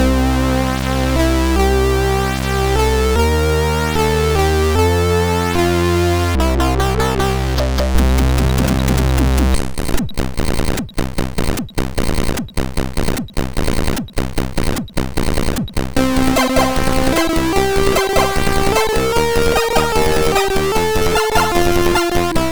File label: Little Song, sid MOS6581R3